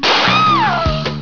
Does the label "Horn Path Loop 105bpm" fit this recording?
No